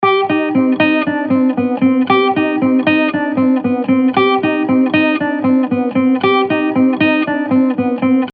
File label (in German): Gitarre Picking Trash Tremolo